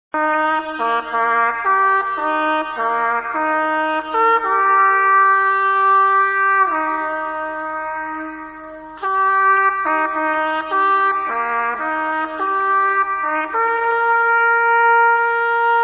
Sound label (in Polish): hejnal; przemysl